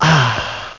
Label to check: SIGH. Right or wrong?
Right